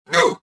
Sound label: pain, rodian